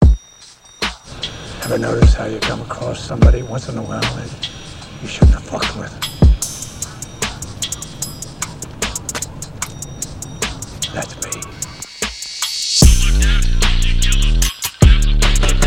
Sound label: PHONK